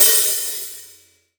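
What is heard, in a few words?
open hi hat, Real Drum Kit; Best Service ProSamples vol.43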